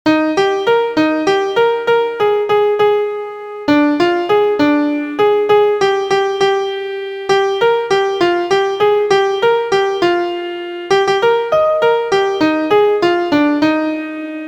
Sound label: MIDI